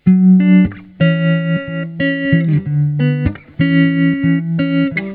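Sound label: riff 4, D♭m, 93bpm, processed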